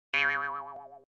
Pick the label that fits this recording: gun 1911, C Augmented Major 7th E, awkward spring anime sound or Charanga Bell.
awkward spring anime sound